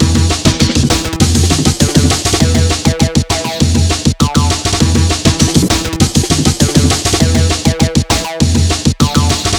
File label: acid galaxy; ゆめ2っき